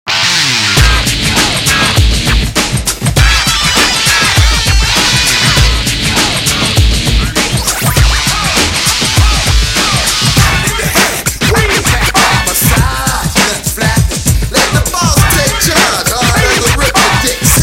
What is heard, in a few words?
Up & Down, Electronica